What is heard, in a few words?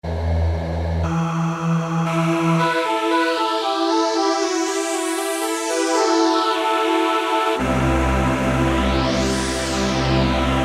Morph Choir, Alesis QS8; Factory Preset examples